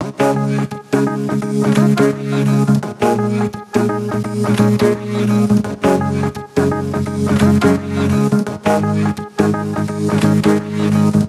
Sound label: songstarter 85 Db; Slate; SD PASADENA Pop Sample Pack